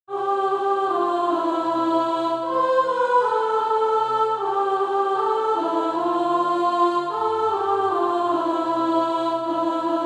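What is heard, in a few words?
soprano, SATB Practise Music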